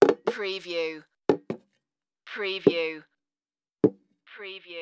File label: put tea pot on table